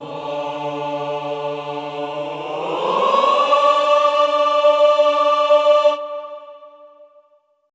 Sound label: GLIS D3-D4, Classical Choir; Best Service; Extended Classical Choir; GLISSANDI